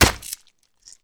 Sword Impact Through Flesh; Weapons & Explosions; Sony Pictures Sound Effects Series Volumes 1-10; Sony Creative Software

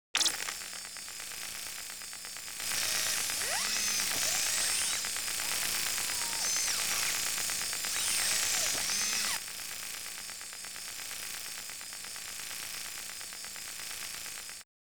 Radar Scan; Electronics; Sony Pictures Sound Effects Series Volumes 1-10; Sony Creative Software

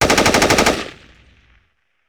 AK-47 Short Burst; Weapons & Firearms; Sony Pictures Sound Effects Series Volumes 1-10; Sony Creative Software

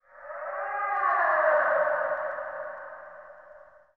Mythic Howl, Vocals Humanoid; Sony Pictures Sound Effects Series Volumes 1-10; Sony Creative Software

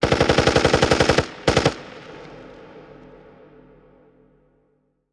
Machine Gun Burst; Weapons & Firearms; Sony Pictures Sound Effects Series Volumes 1-10; Sony Creative Software

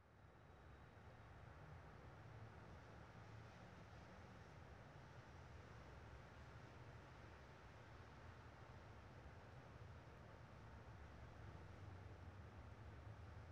Suburban Street Quiet; Sony Pictures Sound Effects Series Volumes 1-10; Sony Creative Software

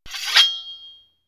Sword Unsheathe; Weapons & Explosions; Sony Pictures Sound Effects Series Volumes 1-10; Sony Creative Software

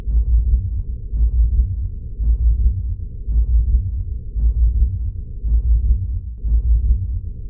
Machine Sonic Pulse; Home & Office; Sony Pictures Sound Effects Series Volumes 1-10; Sony Creative Software

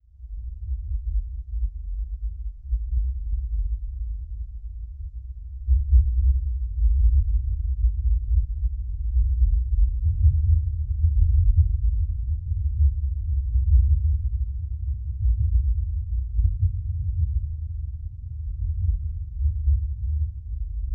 Sub Sonic Sweetener; Impacts & Destruction Sweeteners; Sony Pictures Sound Effects Series Volumes 1-10; Sony Creative Software

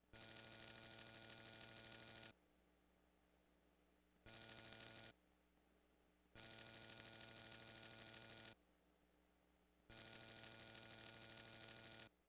Buzz Ringer Multiple; Electronics; Sony Pictures Sound Effects Series Volumes 1-10; Sony Creative Software

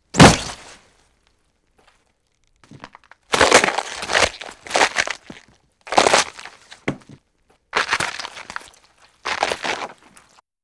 Monster Eat Chew; Animals; Sony Pictures Sound Effects Series Volumes 1-10; Sony Creative Software

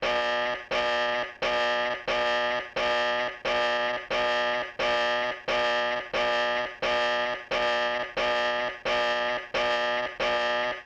Classic Alarm, Electronics; Sony Pictures Sound Effects Series Volumes 1-10; Sony Creative Software

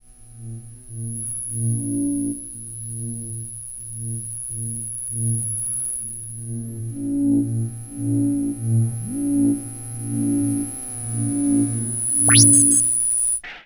Device On Off, Electronics; Sony Pictures Sound Effects Series Volumes 1-10; Sony Creative Software

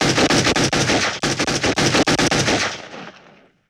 Pistol Shot 9mm Semi-Auto Burst; Weapons & Firearms; Sony Pictures Sound Effects Series Volumes 1-10; Sony Creative Software

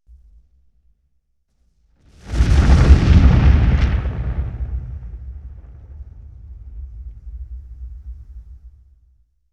Explosion, 5.1 Surround; Sony Pictures Sound Effects Series Volumes 1-10; Sony Creative Software

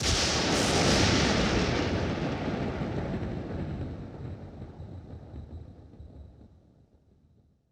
Lightning Strike, Thunder & Lightning; Sony Pictures Sound Effects Series Volumes 1-10; Sony Creative Software

